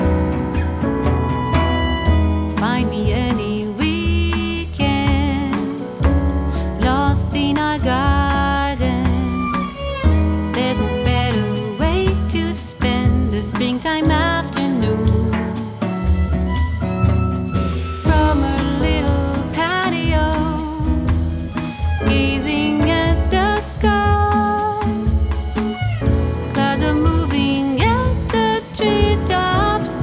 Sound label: The Bossa Nova